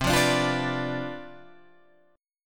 C Major 7th Suspended 2nd Suspended 4th, Guitar Open G, chord